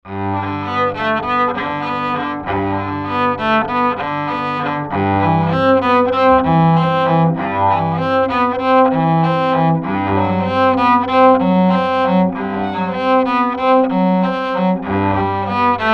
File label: cello